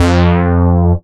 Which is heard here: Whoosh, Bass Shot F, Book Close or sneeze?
Bass Shot F